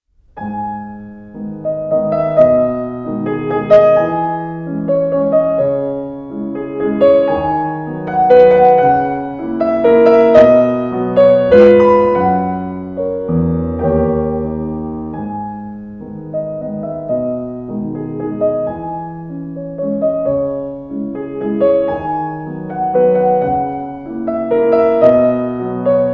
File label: piano vals